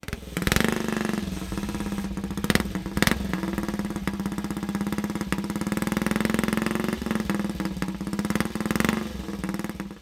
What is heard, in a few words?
AJS racer